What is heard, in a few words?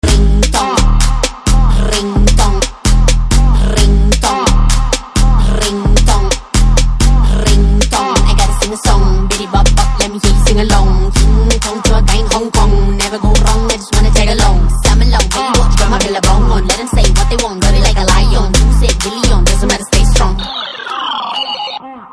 Ringtone